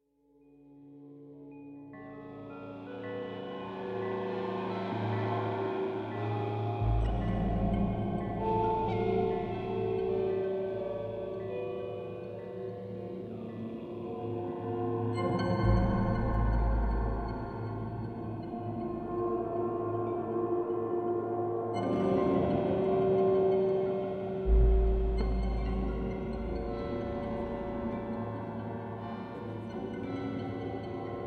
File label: defective wind patterns